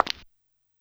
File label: Footsteps, Yamaha PSR-E323 SFX Kit